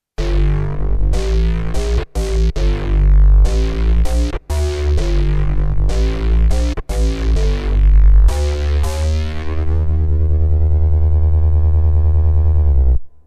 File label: Sh-4d Model FM Kick Bass